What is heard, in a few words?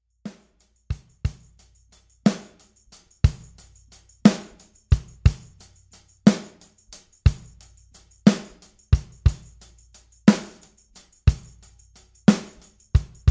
80s pop